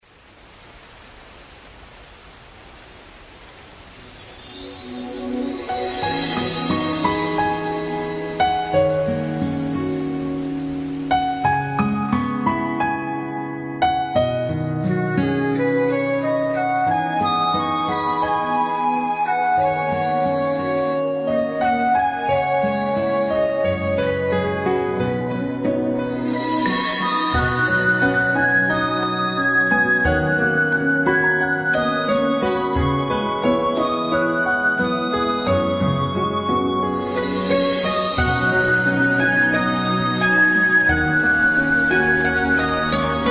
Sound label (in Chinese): 抒情的背景音乐